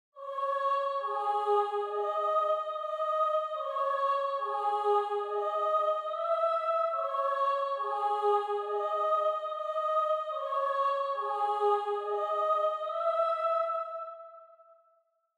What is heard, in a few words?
142 BPM C# Min Choir, Drill; Melody; Loop Stems; Cymatics; 2022 Melody Collection - Drill; Consequences